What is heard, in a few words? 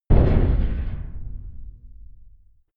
big metal impact low soft